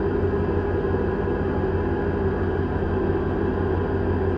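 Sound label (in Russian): равномерный протяжный звук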